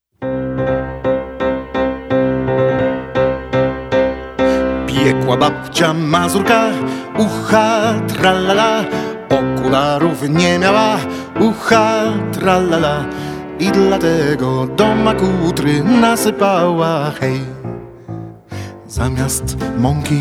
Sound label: Mazurek